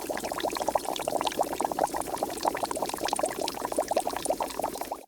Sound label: Water Boiling